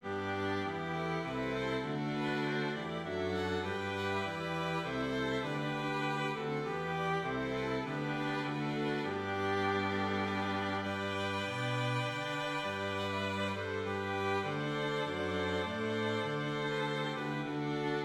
Strings; God Bless Our Native Land (America)

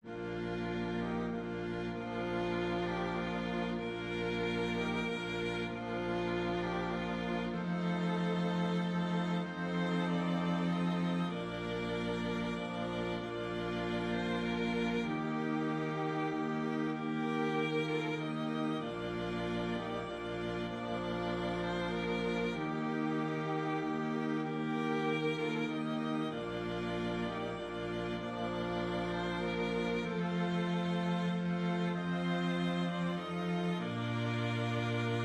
Hymn Strings

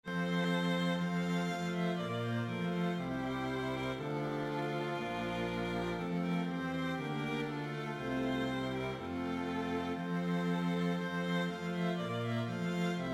Hymn Strings

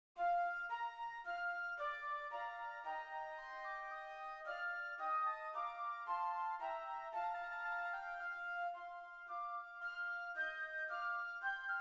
Flutes; Rise Up Ye Saints of God (Festal Song)

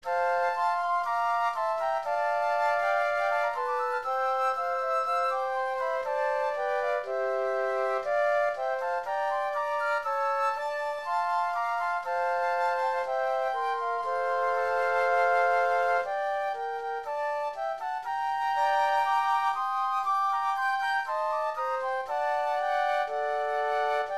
Flutes; Hail Thee Festival Day, or Salve festa dies; easter f major

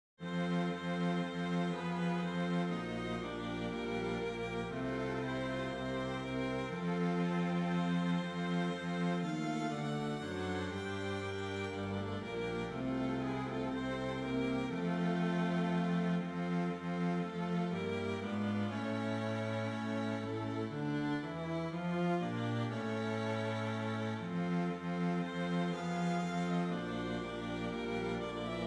String; Hymn

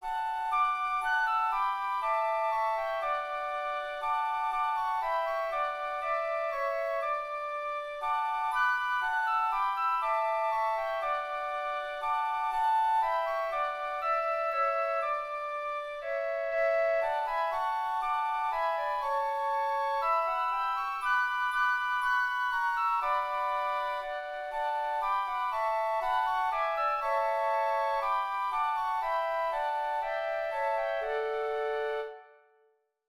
Flute; Hymn

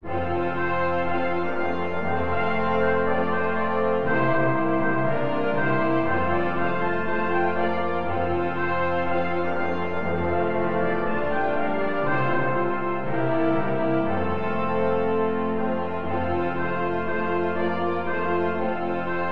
Hymn

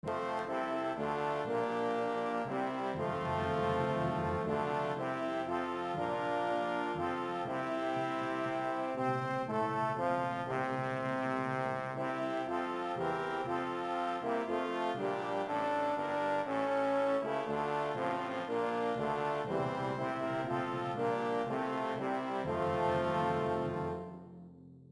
Brass

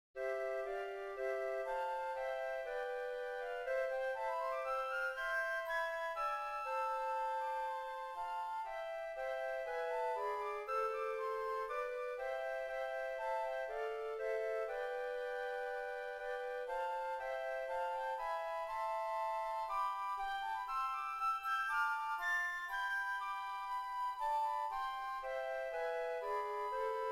Flutes

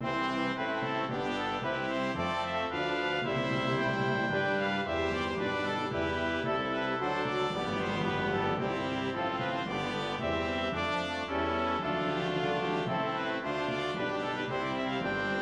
Brass

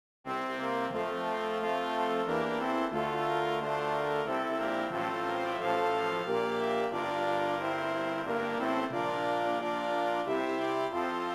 Brass

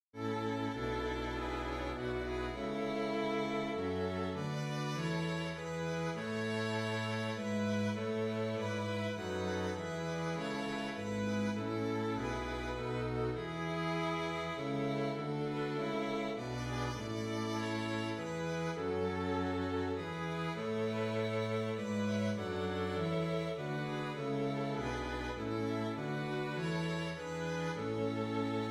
Hymn Strings

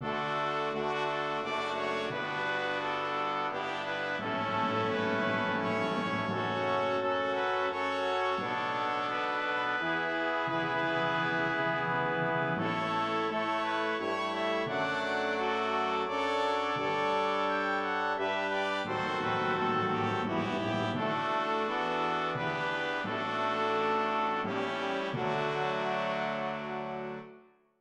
Hymn BRASS

